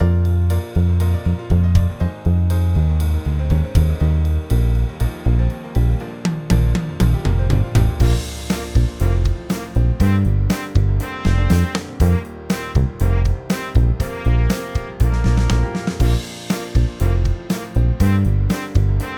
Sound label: Bb Southern Shuffle, brass